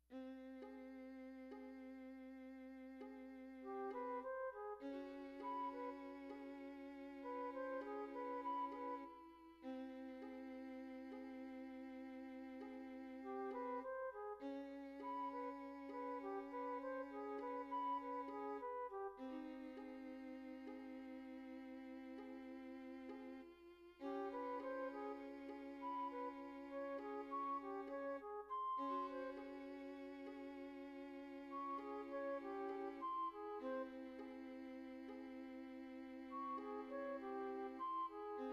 Flute and Two Violins

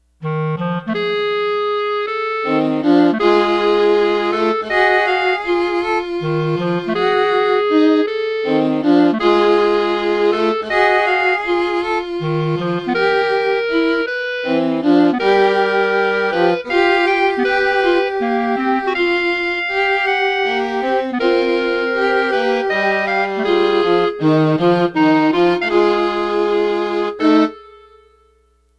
Blues for Clarinet Violin and Viola

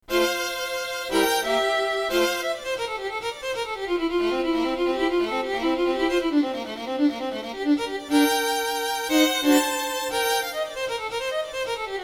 Sonata for Solo Violin in the Classic Style

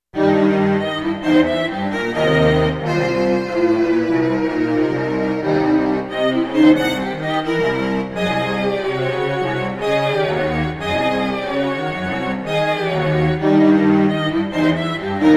per il Violino ed il Violoncello

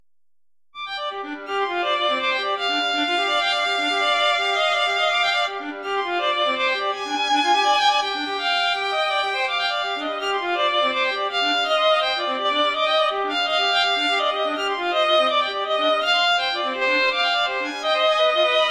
Nocturne for Violin Duo

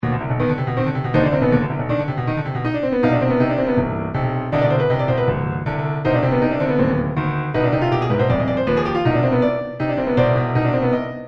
Piano Prelude

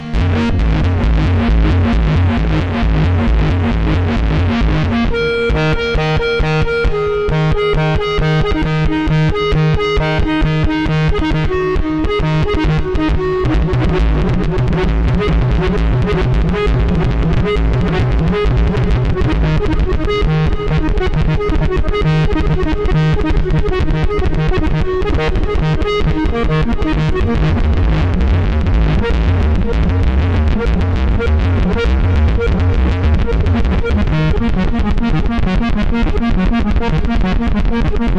noise dance improvisation

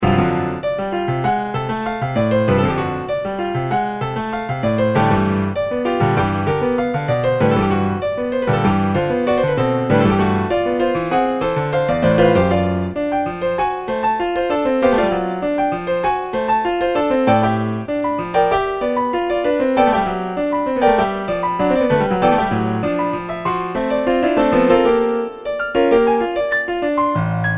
Piano Prelude

